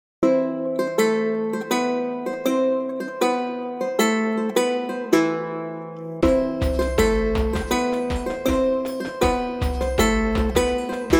World String Loops Ronroco

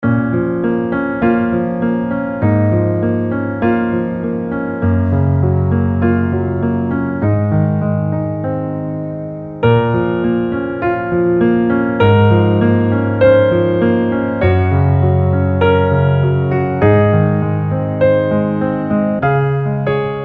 Melodic Keys

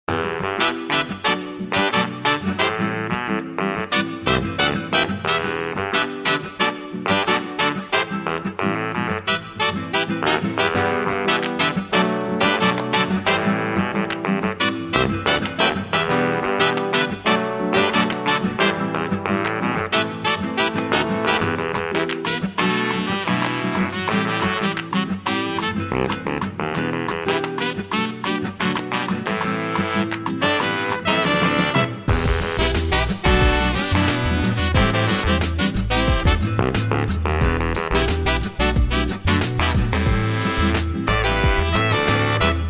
Latin Horns

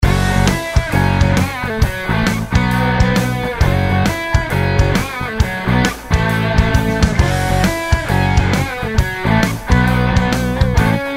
Dirty Guitars

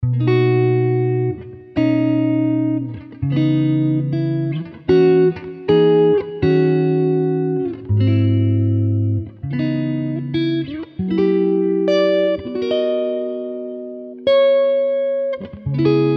Smooth Jazz Guitars; Smooth Jazz Guitars Vol 1; Vanilla Groove Studios

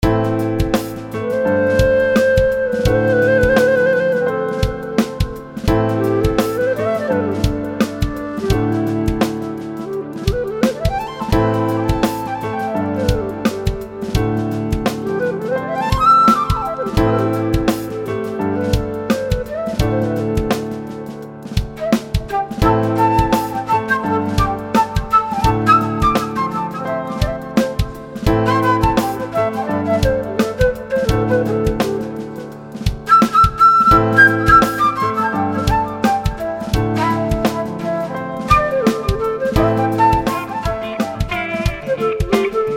Jazzy Flutes

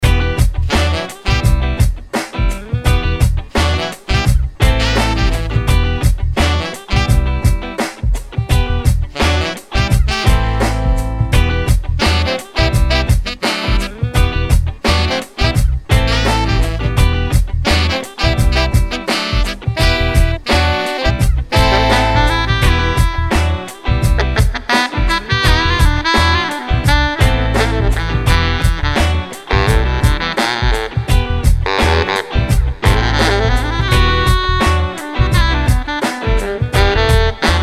Tripple sax

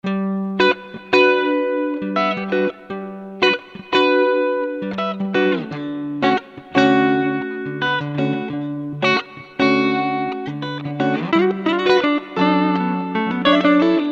Pop n Rock Guitars

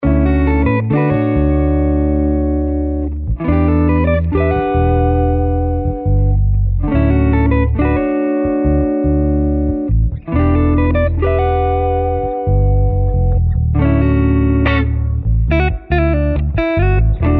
Guitar and Bass Grooves

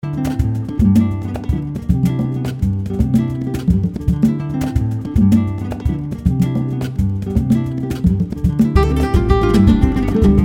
Flamenco Guitars

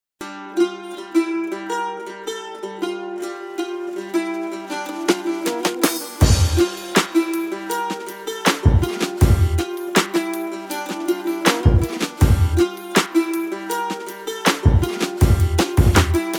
Mandolin Magic

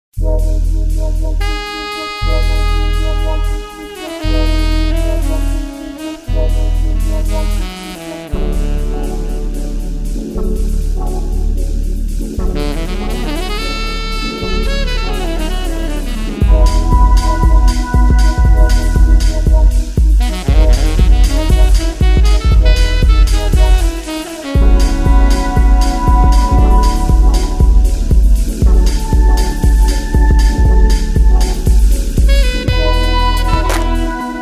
Chilled Sax

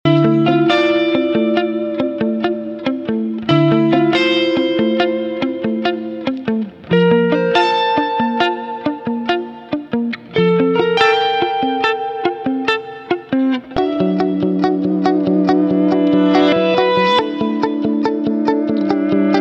Rock Guitar Trap

